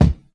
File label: perfect kick drum one shot c sharp key